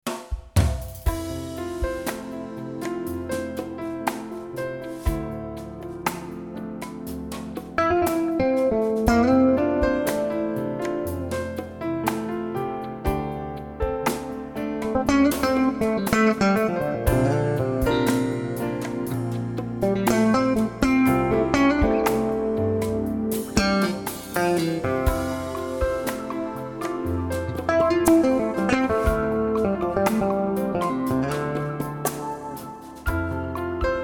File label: E-Bass Solo Bridge